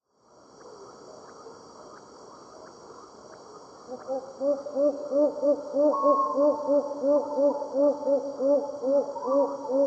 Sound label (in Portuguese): socó-boi